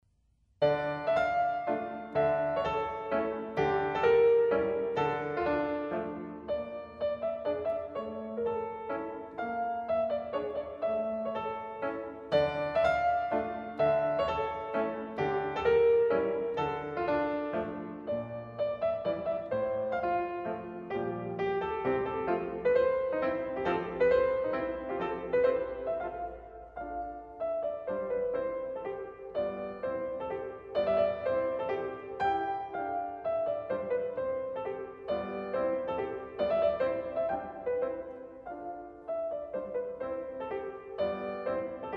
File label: Mazurka; Tsaikovski